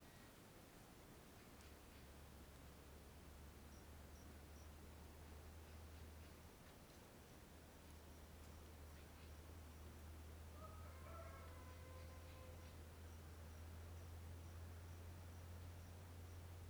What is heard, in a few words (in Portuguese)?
Carro passando pela esquerda com estalos, morcego, galo e grilo; maquinas; canais-stereo; colecao sonora do cerrado